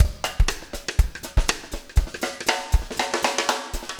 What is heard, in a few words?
breakout 120, 120bpm loops